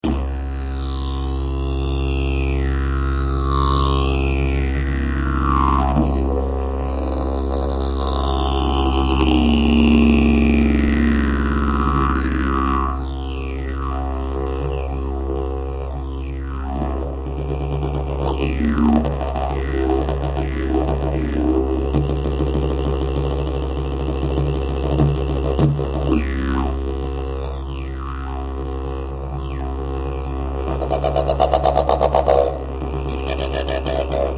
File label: didgeridoo